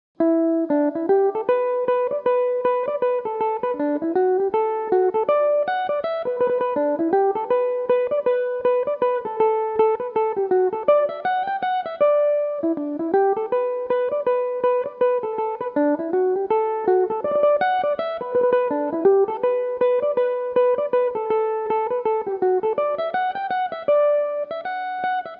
reel, D major, mandolin; Horan's Airport; Belmuse EM-190 electric mandolin

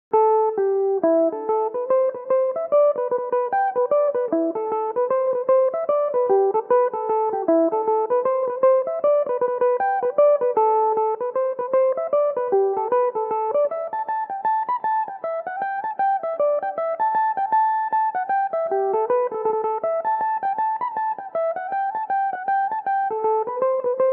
reel a dorian, irish mandolin, tunes

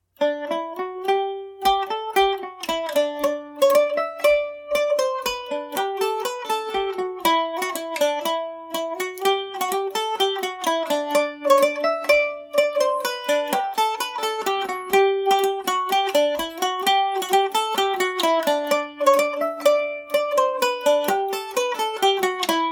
md304, Irish mandolin; boys of wexford

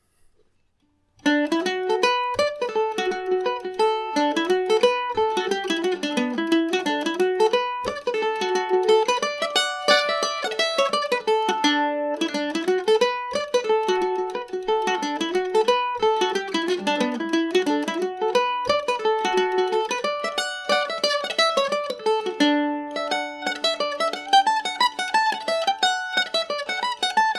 mandolin; love at the endings

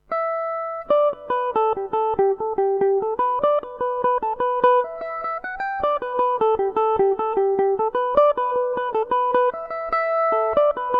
glass of poitin gmaj, irish mandolin

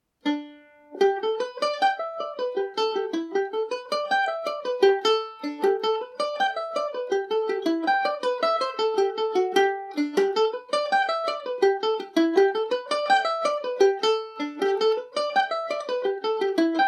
hooded man revisited gmaj, mandolin; G&O #34 mandolin

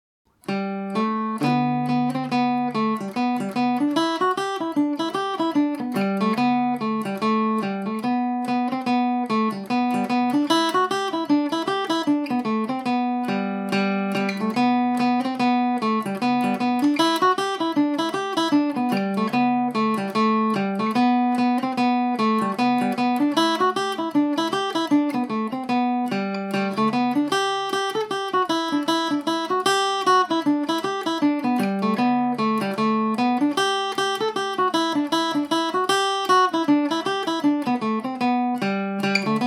green cockade gmaj, mandolin

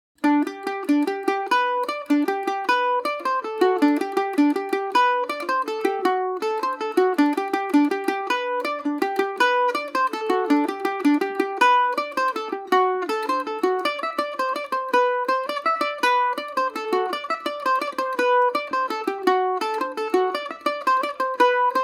galway jig G major, irish mandolin; Paris Swing Macaferri mandolin; The Galway Jig

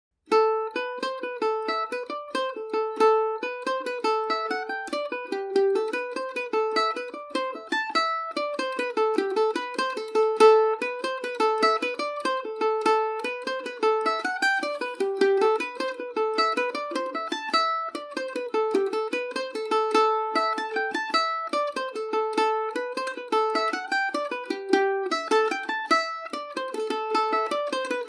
gallowglass ador, mandolin; Paris Swing Macaferri mandolin

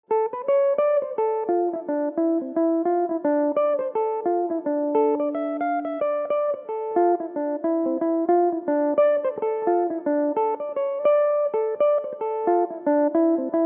jig d dorian, mandolin; Julia Delaney's; Belmuse

